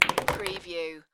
Billiard, Pool, Snooker - Ball hits other ball which falls in pocket